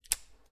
Click On

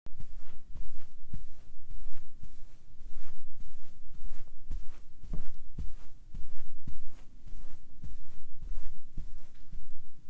Footsteps On Carpet

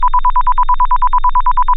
Pulse

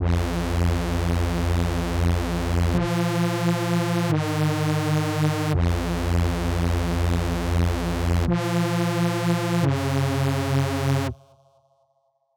Dnb Reese

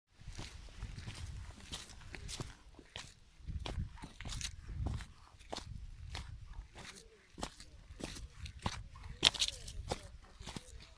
Mud Leaves Walking Heavy Shoe

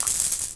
Marble Pour

